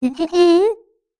ehehe

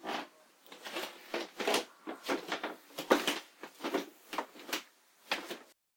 wood walk

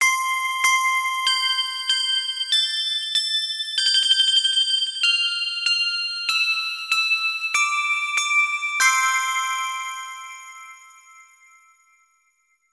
handbell kirakira

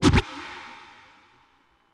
blow

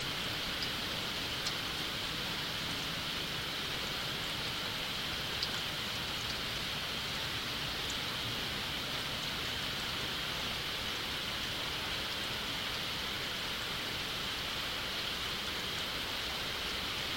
rain

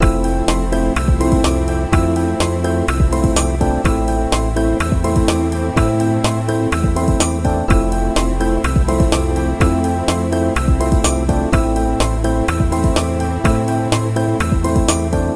thinking

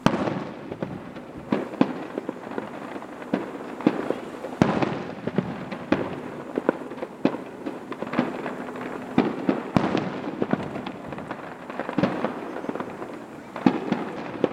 fireworks long

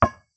ball bound

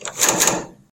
key

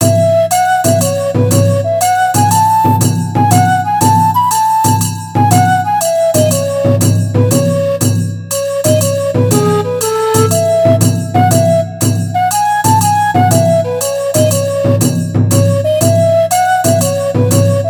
dance song

